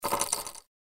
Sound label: chips bet all in; poker game